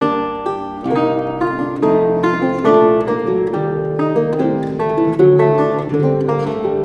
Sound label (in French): gavotte